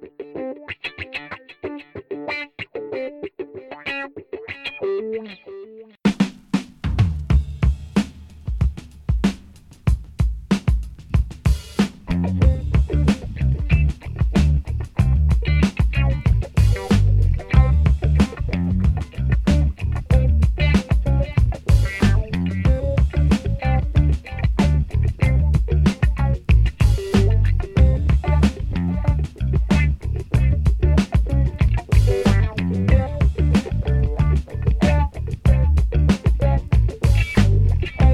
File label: Tea Break Funk